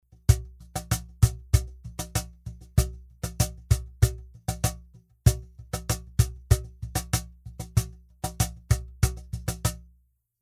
Cajon Groove geschlossener Pitch Control

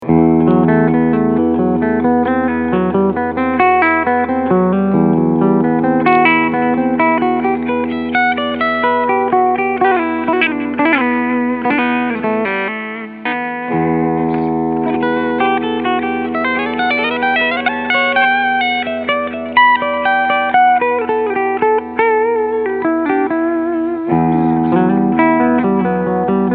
Schecter KM-6 Cleansound Steg KM Modus

